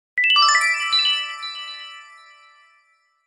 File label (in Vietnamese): Nhac chuong tin nhan blackberry